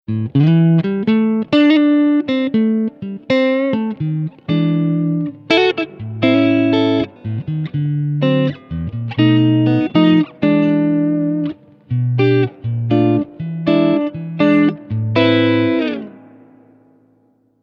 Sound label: Rockerverb Clean Tele; Rockerverb 50 MkIIH; Nash